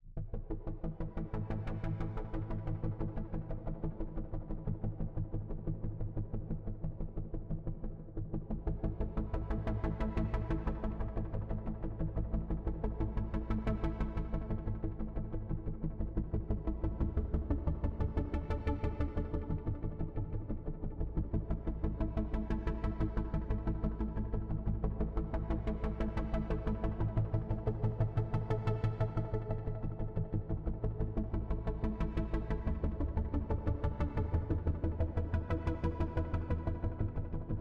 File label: Bass